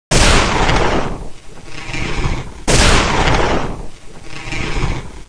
gunshots

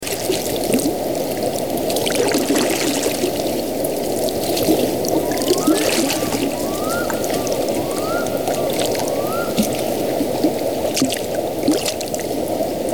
canoe paddling

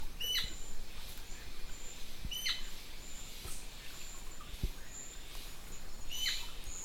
amazonian royal flycatcher

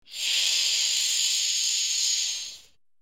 snake hissing

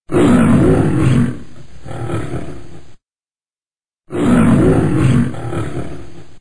lion

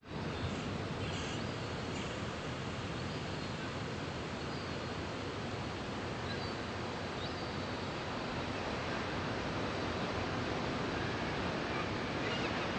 beach ambience

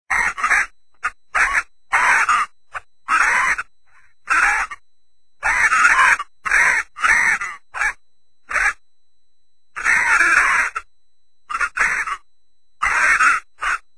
parrot squawks

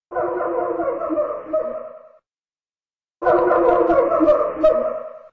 monkeys

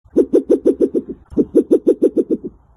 Spectacled Owl Amazonian

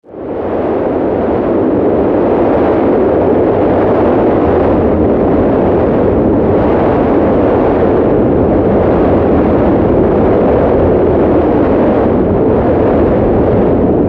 hurricane wind

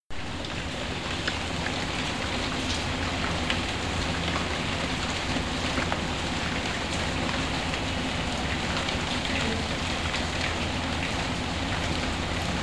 rain and campfire

